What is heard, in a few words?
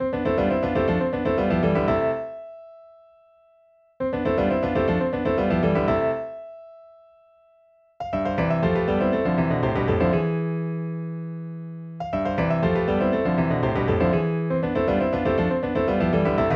Four Ring Tones